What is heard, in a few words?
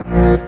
Bb STAB; funky; Loopmasters; Funky Underground Vol 2